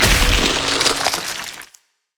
ring of frost; LoL; lissandra

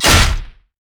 basicattack dragon; LoL; shyvana

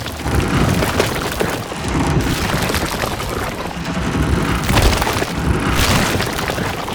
drill; odin

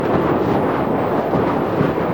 eye of the storm loop; LoL; janna

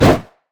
great wraith basic attack; LoL

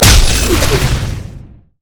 pick a card red card; LoL; twistedfate

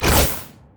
gravitation field toss; LoL; viktor

